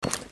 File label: aim